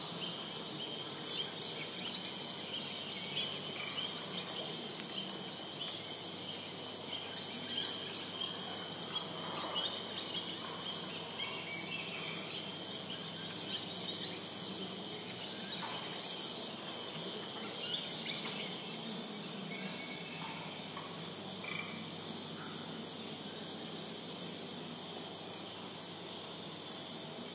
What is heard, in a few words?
sonic rainforest in birmingham